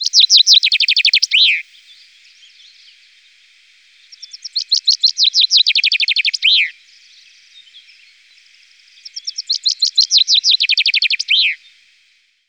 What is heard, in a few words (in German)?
Buchfink